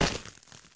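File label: Bullet Impact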